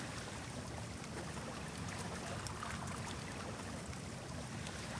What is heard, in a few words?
Pools, water splashes